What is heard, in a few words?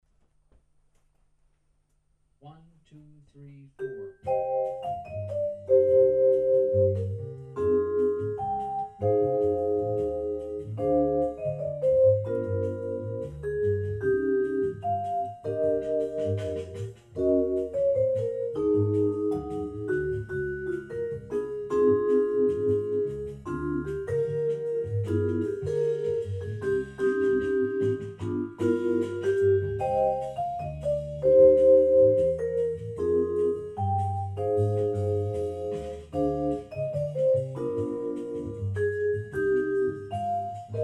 Chords and solo